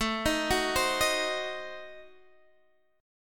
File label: A Diminished 7th A down Guitar Half Step Down